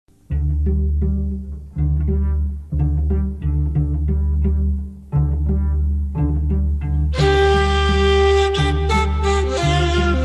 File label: Ney, Sufi Dance